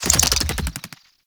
Infested Big Ashen Melee Start; Warframe

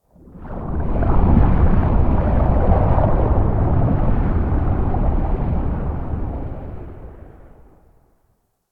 Stalker Cave Underwater Whoosh; Ambience; Warframe

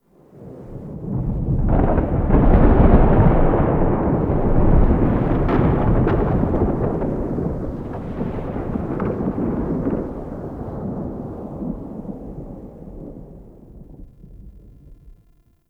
Murmur Exterior Rolling Thunder A; Warframe; Ambience; Duviri

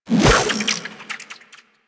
Fishing Spear Line Retract; Warframe; Venus Fishing Spears

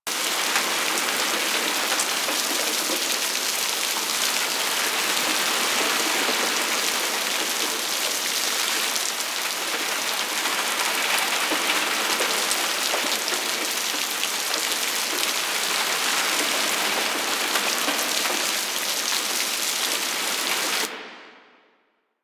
Rain Loop; Exterior; Warframe; Grineer Shipyards

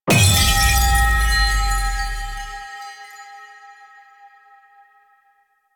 Void Tear Open; Cephalon; Warframe; Cephalon Weave

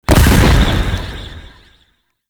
Wraith Leap Jump Land; Enemies; Warframe; Zariman

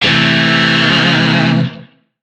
Shawzin Pent Maj Chord A; Music; DE; Warframe; Lizzie Shawzin

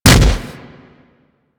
Impact Flesh, Weapons; Warframe; Furax

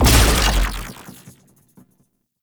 Shot; Warframe; Weapons; Corpus; Crp Freeze Ray; Tenet Glaxion

